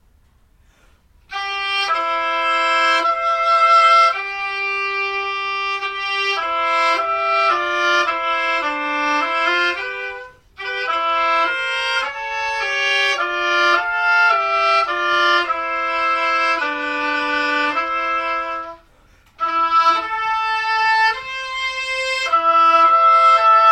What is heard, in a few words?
oboe duet; lewandowski adon olom